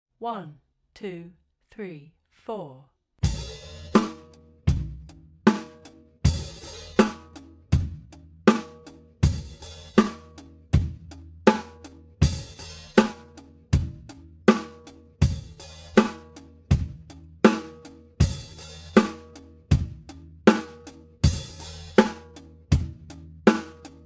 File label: Slowed down drum groove